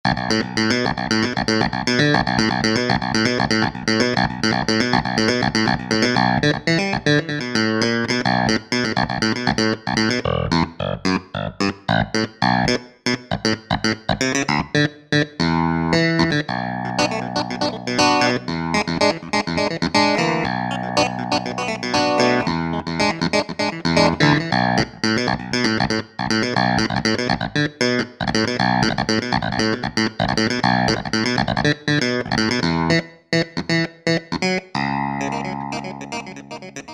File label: Clav; Roland SRX-07 Ultimate Keys; Clav 2 SRX